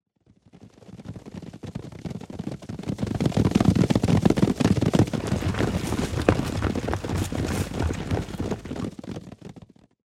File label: Troops; Age of Empires III; cinematics